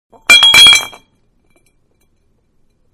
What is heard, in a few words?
Small Bottle Fall On Concrete; Glass; Materials; Manhattan Production Music